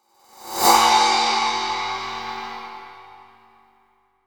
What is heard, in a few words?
ride